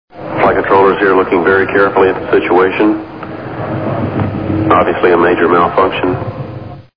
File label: major malfunction; nasas genesis